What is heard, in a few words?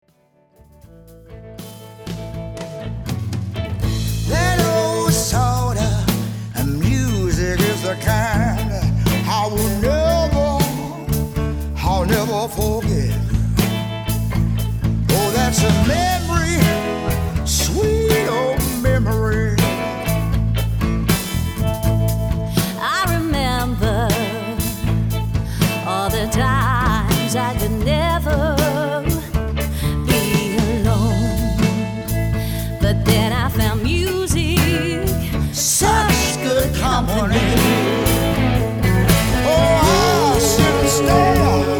Blues to Music